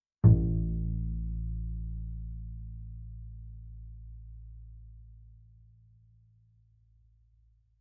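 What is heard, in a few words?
Bass pizzicato fortissimo sul A A2, Double Bass; MIS Pitches - 2014; Strings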